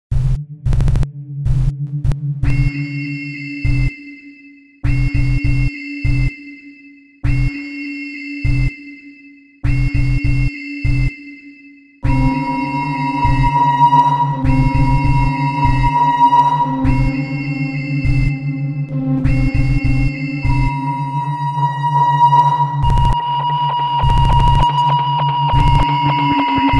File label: Sign Wave